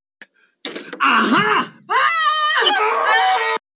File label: aha aah